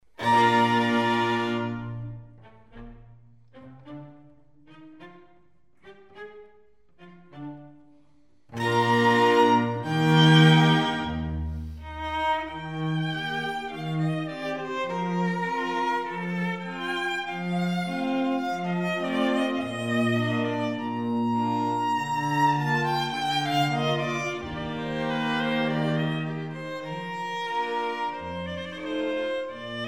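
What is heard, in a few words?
quartet; viotti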